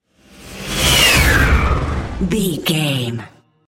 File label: airy whoosh large